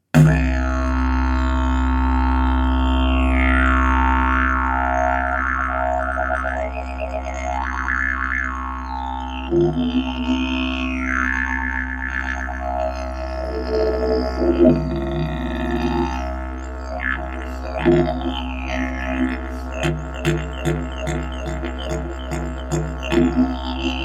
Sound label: didge